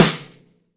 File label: chunky big snare; single hits